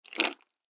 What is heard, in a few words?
mud wet suction